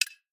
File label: click Off